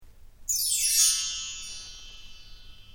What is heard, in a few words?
bell tree